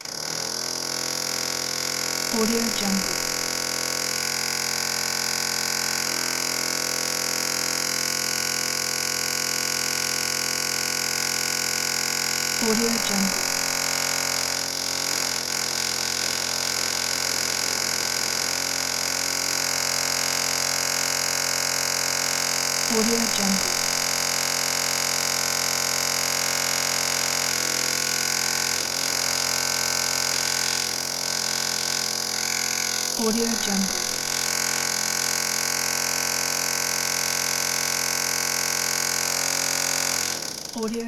indoor wall drilling

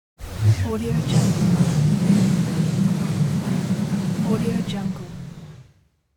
big spinning whoosh

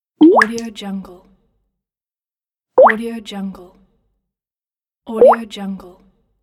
water drop

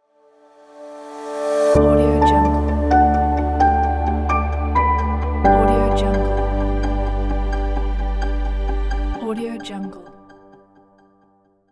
elegant logo

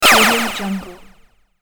zappy laser echoes

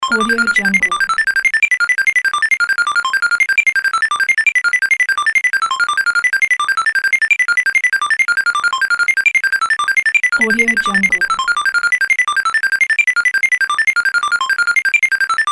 slot roll spin

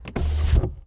servo window; soundmorph

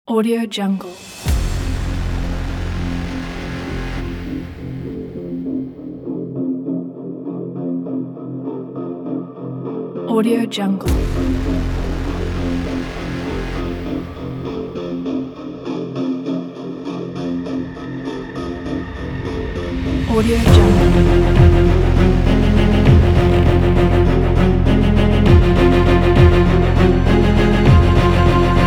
the tension

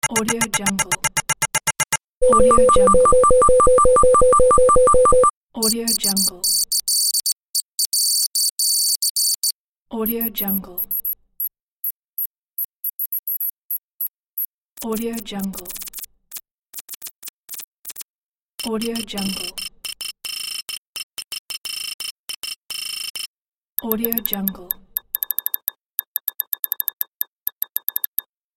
digital typing

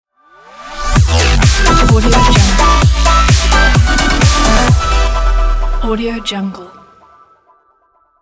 complextro logo

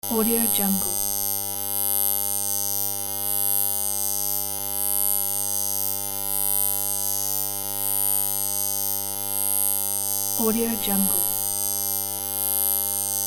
tattoo machine

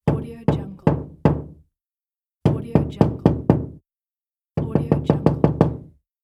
the knock on wood

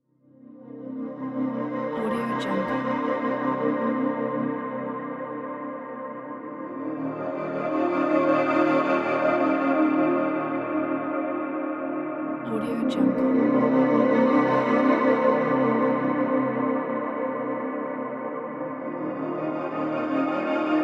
dark cinematic atmospheric background

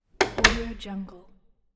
closing door

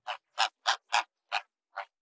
poultry wild geese; soundbits-soundfx

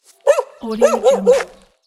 barking dog

